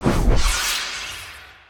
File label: Samurai Ronin Rush